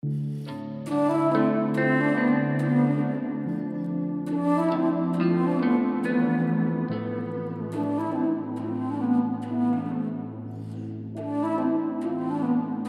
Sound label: 28-29-31hz Low bass